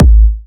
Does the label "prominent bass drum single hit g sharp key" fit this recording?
Yes